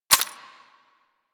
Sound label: Bard Rifle Mech Secondary; Weapons; Warframe; Tenno